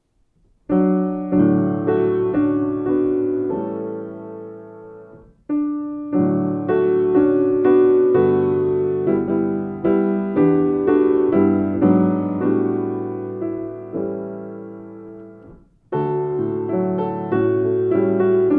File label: children s song piano accompaniment; Peace I Leave With You